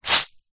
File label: wet cloth squeeze rinse; foley; zapsplat